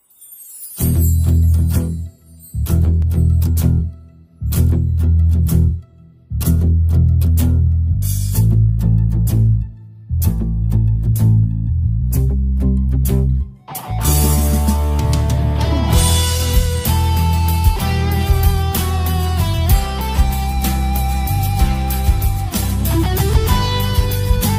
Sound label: instrumental; Kiralik Ask Muzikleri